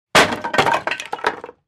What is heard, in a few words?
Chair Collapse Sngl B